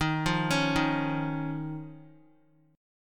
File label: Eb 7th Suspended 2nd, Guitar, chord; x,6,5,6,3,x; Eb7sus2 arpeggiated; JGuitar